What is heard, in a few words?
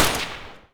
polycraft end